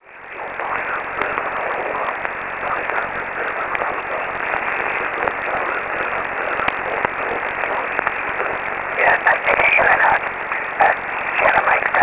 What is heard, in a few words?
50MHz ssb aurora